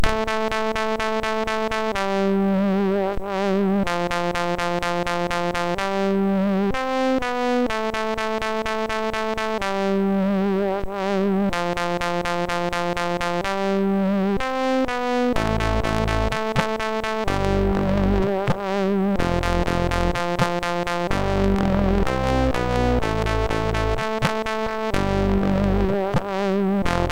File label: Game Over, sid MOS6581R4